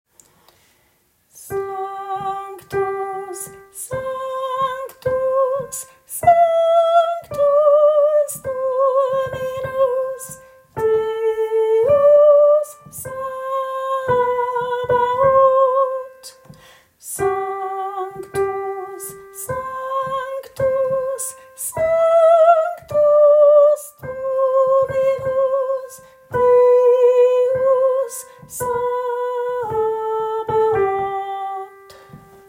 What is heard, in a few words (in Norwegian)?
sopran; Sanctus